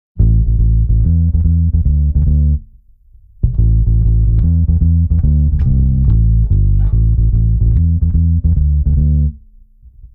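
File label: bass reggae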